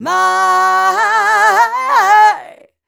f mel d flat, fem Vocals

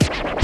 Kick Scratch; EMU Xtreme-Lead-1

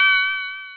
Multi FX

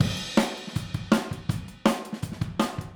Overhead ST, Drums, 162 BPM; Drums from the Big Room The Mixes; Take Your Toms Off; Chorus 02

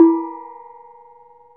KETUK OPEN, Bronze Gamelan

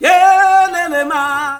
"YEAH-MA", MALE; Spectrasonics; Heart of Africa 2; S.MALEAMB

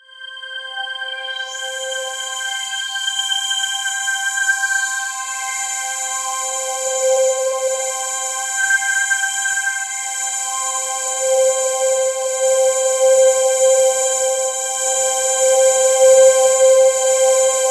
Roland JV 2080, poly saw

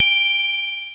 Casio SA-10 Triangle

